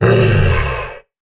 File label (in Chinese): 骆驼坐下声